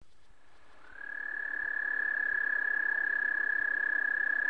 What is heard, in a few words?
eastern american toad